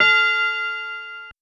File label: RBell A5